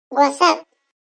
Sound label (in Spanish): nuevo sonido de notificacion de whatsapp para dispositivo